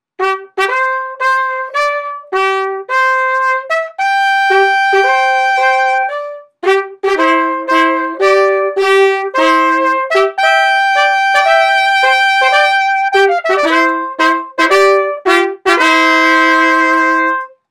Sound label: Flourish; "Mighty Hudson" Flourish